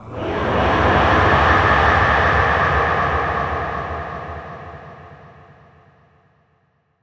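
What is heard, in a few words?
Distant Abstract Scream Short, monster sounds; TheDeclineOfWarriors; Monster Sounds Volume II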